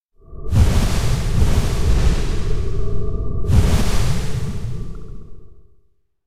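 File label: electronic bubblecosmos move multi; anemoi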